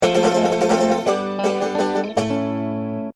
Outdoors Old Positive Pos stab banjo gitaar; westerado double barreled

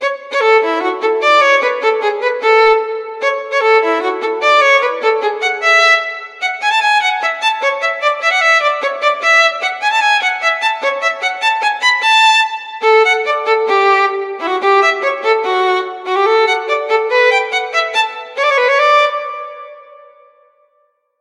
Orchestra Music; Cookie Run: Kingdom; Deco

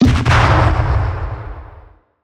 Attack Impact; Dumbledore

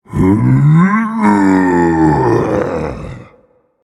Vocal Efforts Light; Minecraft Story Mode; Colossus

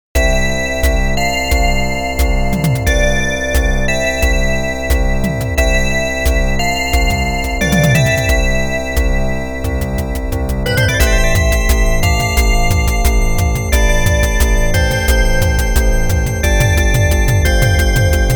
A victorious bell is ringing -BELL-; Technosoft Music Collection Herzog Herzog Zwei

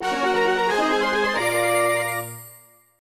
Stage Clear Fanfare; Dr. Luigi

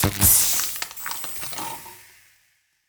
Electricity

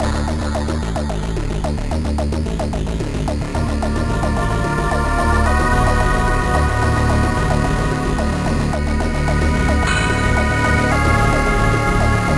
Elevator; Scurge Hive; nintendo ds

